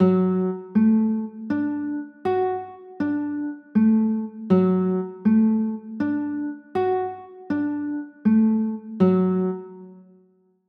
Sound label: d maj, chords